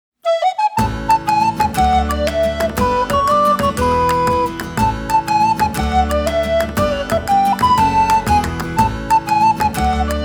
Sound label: Shop Jig Recorder; Atelier Rorona Arland no Renkinjutsushi